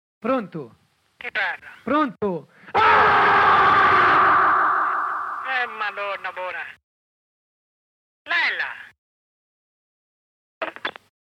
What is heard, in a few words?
Aaaargh !!!